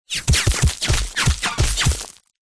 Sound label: bullets x7 whizz hit ground dirt stones debris close by fast; zapsplat